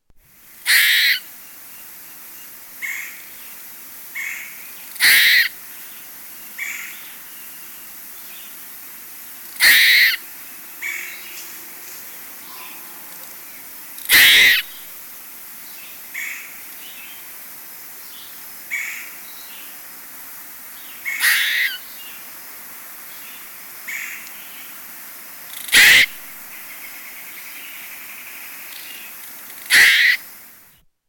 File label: eastern gray, squirrel